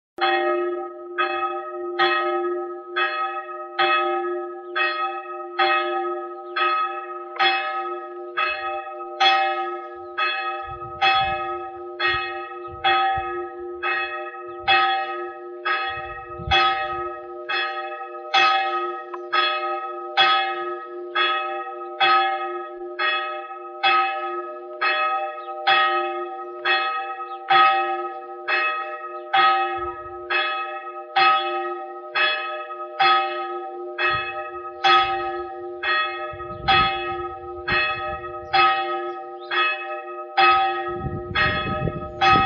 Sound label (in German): Weissuhnen Glocke; ostpreussen; Kreis Johannisburg